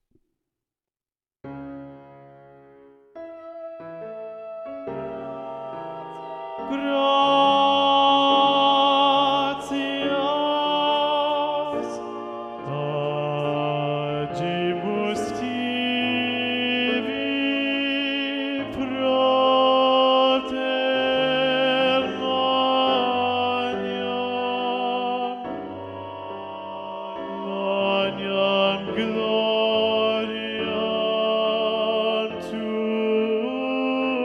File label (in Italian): Gloria tenore; Gratias; Mozart; Mass Cmin